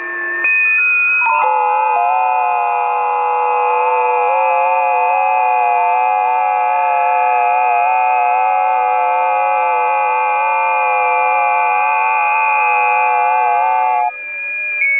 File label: 20m busy, ft8; Karlis Goba